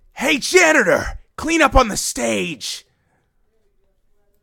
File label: game over